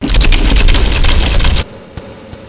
claw strike; svencoop; time

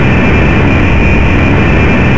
car run; tfc

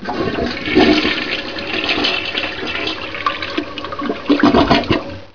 flush

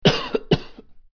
cough

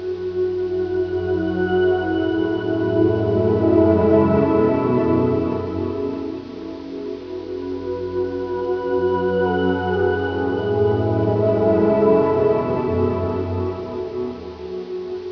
prayers; svencoop

